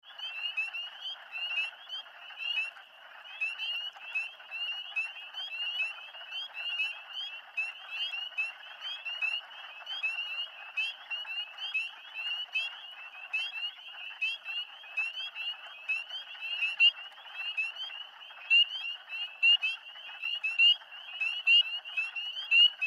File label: frog calls mixed chorus spring peepers and wood frogs in slight rain